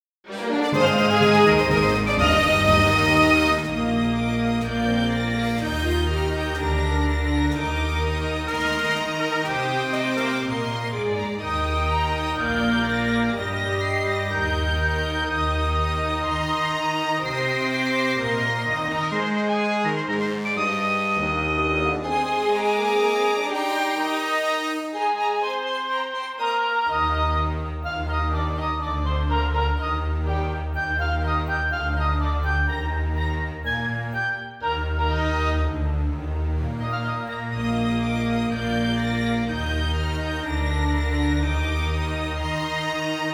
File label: fanfare; rpg用ゲーム音楽